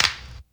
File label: clap a sharp key; single hits one shots